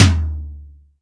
acoustic tom sound f sharp key; tom drum samples

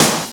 studio snare drum g sharp key; snare drum one shots

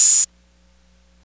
long tail reverb open hi hat sound a key

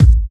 sharp kick drum single hit a key; kick drum samples

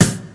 clear snare e key; snare drum samples; drum single hits one shots

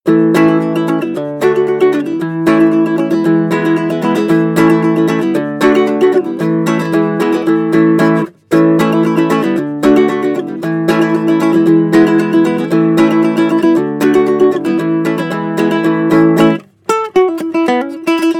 baritone ukulele country sequence; loops

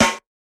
dirty snare f sharp key

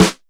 short snare one shot f key

